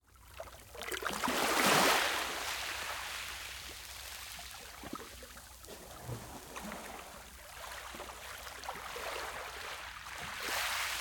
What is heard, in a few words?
Small beach, gentle waves, echo; Ivo Vicic